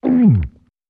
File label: Gigantic Monster G; monsters